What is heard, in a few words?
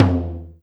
Tom Mid